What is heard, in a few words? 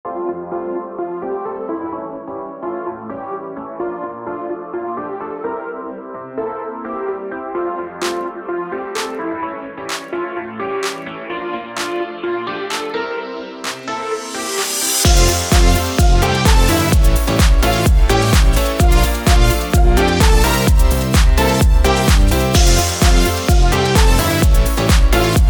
EDM